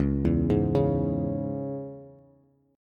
C sharp Minor, Bass; arpeggiated; chord; 9,7,6,6; JGuitar

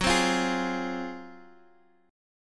F Minor F sharp, Fm/F# chord; strummed; JGuitar

